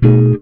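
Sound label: guitar chord; Univers Sons; Jazzistic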